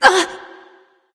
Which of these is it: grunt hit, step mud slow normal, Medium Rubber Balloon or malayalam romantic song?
grunt hit